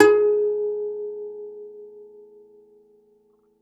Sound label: DOMRA, Segundo Domra, Plucked Strings